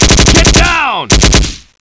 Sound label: warning; ambience